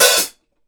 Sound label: OPEN HAT, HI-HATS; Sampleheads; New York City Drumworks VOL-1; KD HI-HATS